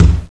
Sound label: Shoop Hit Backboard; Intel; Createshare; PC Camera Games